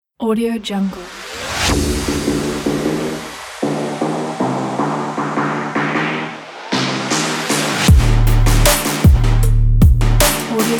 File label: trap